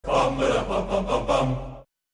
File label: notification